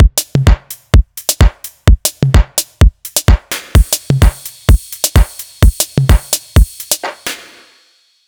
Gqom Drum Loop

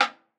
Hihat Lofi

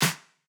Clap D-

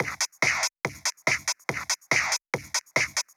DRUMS 142 BPM

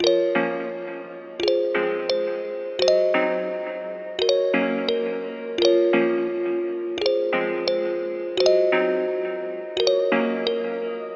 Keys B Minor 86bpm

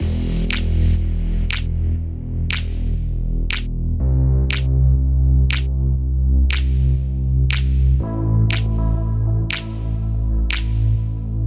Drums 120BPM Dm Deep Full

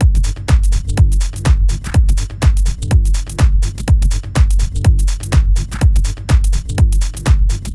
drum loop 124 bpm

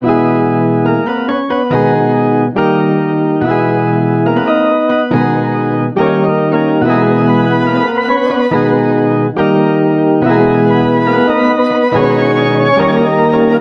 141 Drippy Loop C-m

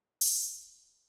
Cymbal 148BPM G- Sad Analog

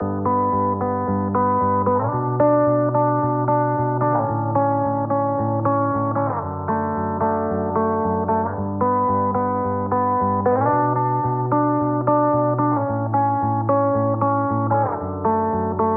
Guitar

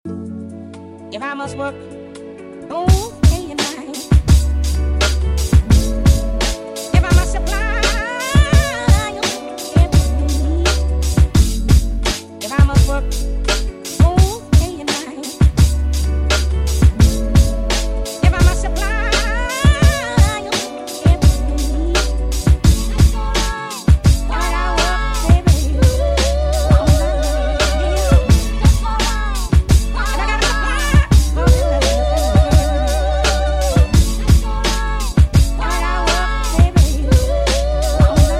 drum kit; Hill Forest

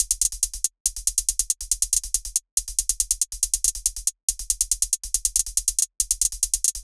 Cymbal Loop 140BPM

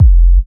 Kick Fm

